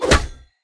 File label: spiders heroe melee